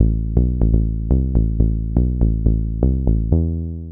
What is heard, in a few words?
122 BPM Bass Synth C maj A min Tonic A; Pro Bass Synth Studio Kit; MMB